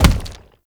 Arm Pat; Enemies; Warframe; ManInTheWall

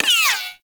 Exalted Sniper Ricochet; Warframe; Frumentarius

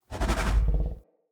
Battle Dog Relax; Animals; Vocals; Snc Battle Dog

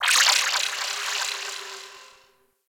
Ship Data Chatter; Warframe; Corpus Ship Remaster

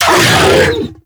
Infested Juggernaut Vocal Pain; Warframe

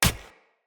Short Sword Impact Player Flesh; Weapon Impacts; Enemy; Swords; Sinecure Short Sword

